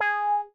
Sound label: G#5 meow